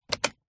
car seatbelt click lock; transport

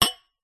pub wine glasses cheers toast; field reports

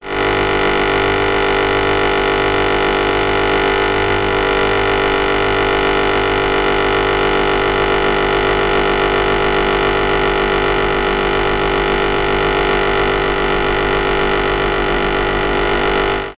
household fridge vibrating buzz

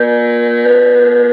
Synthetic Alarm; martyn stonehouse

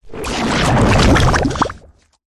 cartoon slime monster bubbling wet gloop movement

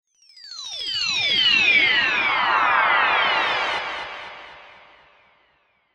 sound design descending bright tone shrill

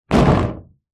impacts plastic hollow unit impact drop

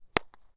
sport baseball catch glove; martinimeniscus

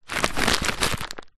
tent pegs in pouch movement; foley

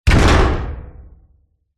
human body slam against metal surface

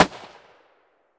gun tikka t3 battue 308 Swpph Shot Weak

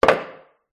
pub wooden bar stool set down on tile floor; field reports

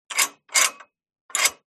household gate bolt metal turn lock or unlock; zapsplat

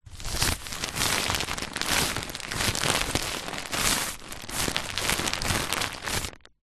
tent in bag rolled up handling movements

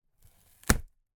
foley wood hardboard thin snap

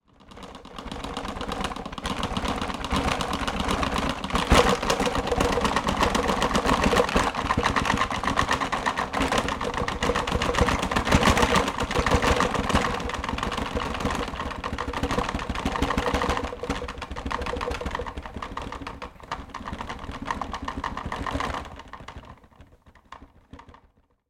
toys bathroom bucket shake rattle earthquake

